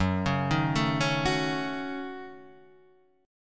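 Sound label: Gb Minor 6th Gb, 8 string; arpeggiated; JGuitar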